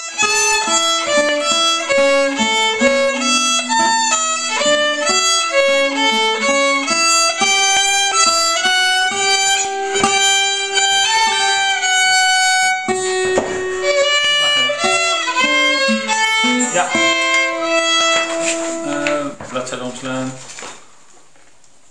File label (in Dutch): Liesje speelt viool